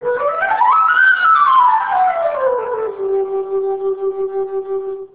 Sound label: quena alone